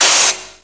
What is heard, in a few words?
air hiss; quake2